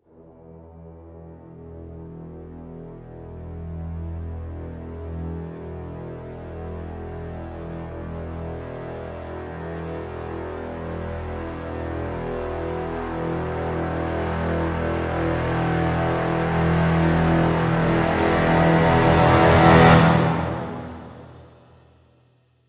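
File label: Crescendo Brass Swell Lo (E)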